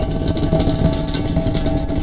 trash; KINGPIN

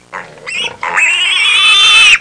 piglet squealing; farm animals; Sound Effects for Multimedia - Volume 1

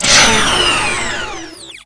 Game Shutdown; warzone

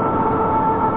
Lazer

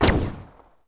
Bolter; PC Gamer IT CD 41

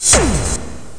ExtraLife; Monkey Shines 1.2.1

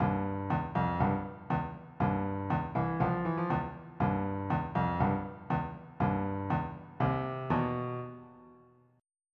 Funk

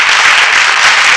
applaud; People; Sound Library 2000; Wayzata Technology

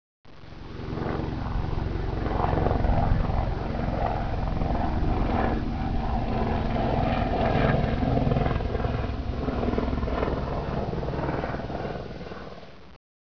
airplane

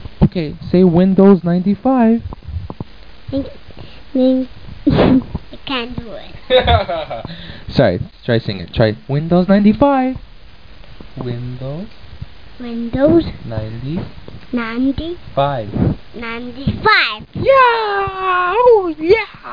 win; WIN95

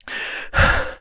player breathe; PC Gamer IT CD 41; Daikatana

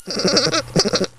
Gopher Laughing; Koji the Frog 2.0.1

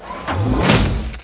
FOOTSTP; PC Gamer IT CD 38; Requiem

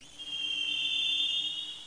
wand; Mr. Wonder's Greatest Toyshop on Earth; theshop

